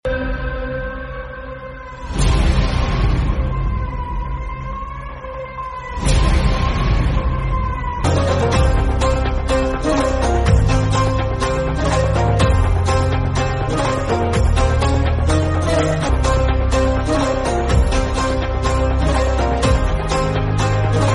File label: adventurous music mobile tone ringtone